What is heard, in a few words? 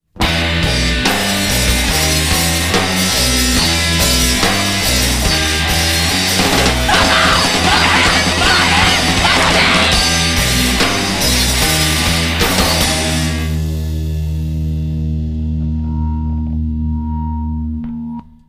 power violence